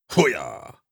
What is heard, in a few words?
effort grunt male